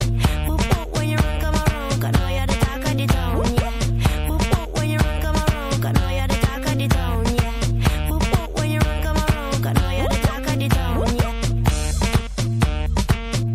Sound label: columbian ringtone